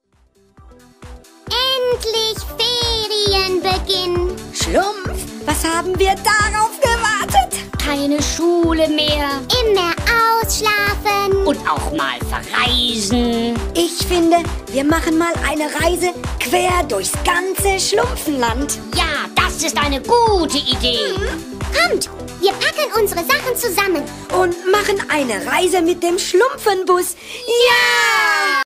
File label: jingle; schluempfe